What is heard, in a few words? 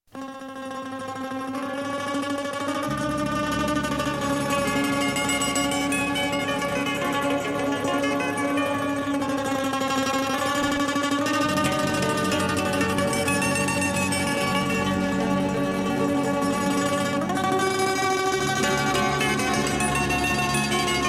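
yaponskie gitaryi, Japanese guitars; Ochi Chernyie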